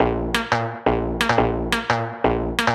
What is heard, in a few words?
Stab Loops; neuro; Optiv & BTK